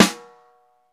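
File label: Snare, Roland; Real Snrs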